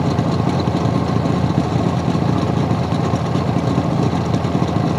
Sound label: machine Engine; angry birds rio; Forestmachine Engine